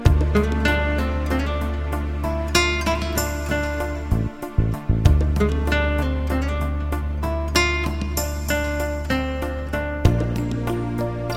emotional guitar

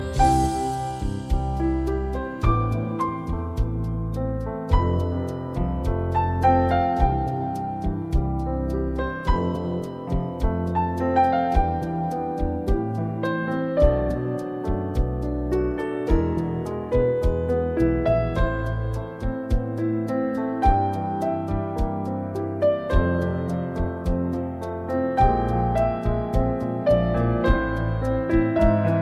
romantic piano

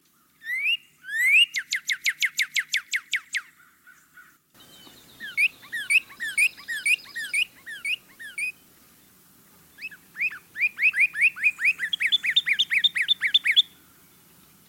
northern cardinal singing

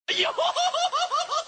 laugh yohoho

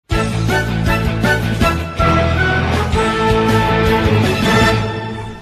ringtone; dragonball

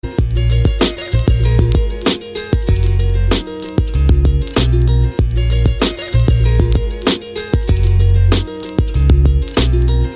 thug poetry instr

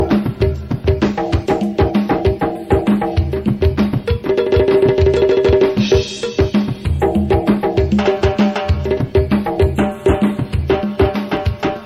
drum music

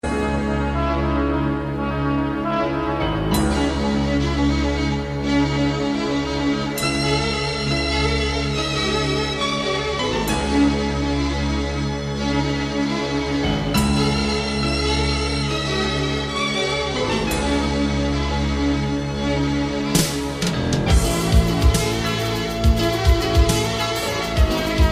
iraqi music

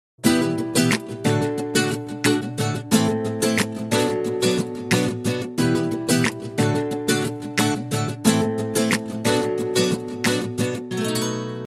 guitar

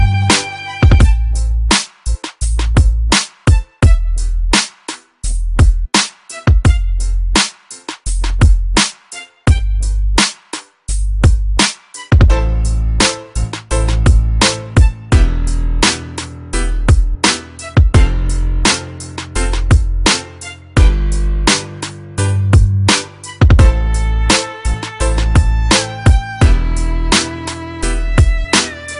hip hop instrumental

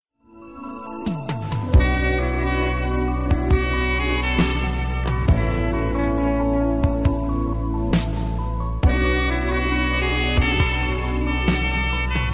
sax

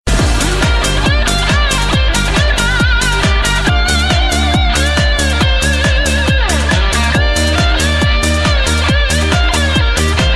electric guitar

